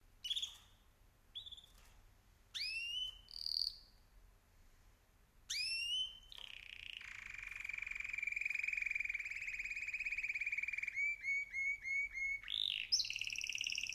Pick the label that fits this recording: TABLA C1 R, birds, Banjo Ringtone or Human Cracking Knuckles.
birds